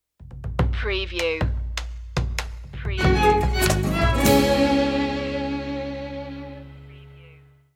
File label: celebrating world music stinger